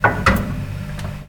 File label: fridge Take; Singles Flirt Up Your Life